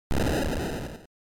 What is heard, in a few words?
General Noise Single